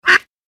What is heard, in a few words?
Duck quack single call; bird